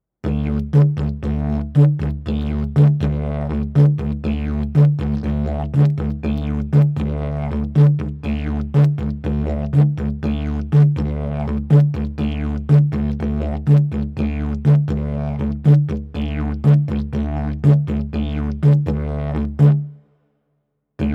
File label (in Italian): ritmo livello intermedio